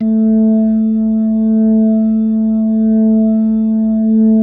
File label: A3S, B3